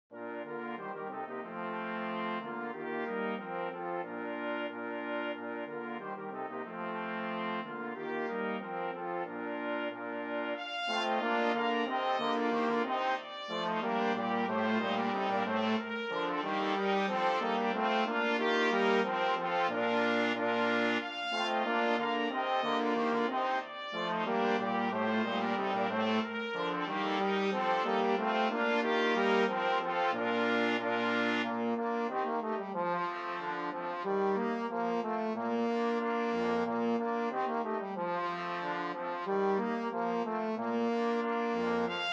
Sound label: Ding Dong